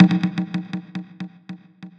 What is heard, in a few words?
Error Delay; FREE SFX PACK VOL 2